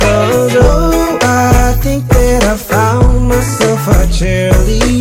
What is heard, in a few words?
cheerleader